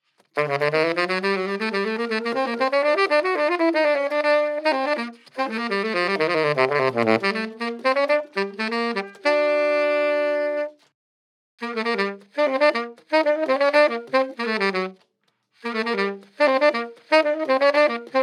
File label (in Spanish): Sonido saxofón